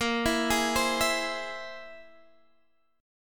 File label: C Augmented 7th A sharp